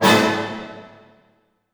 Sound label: D# MIN FF, ORCH HITS, Orchestra; Sound On Sound; Peter Siedleczek's Orchestra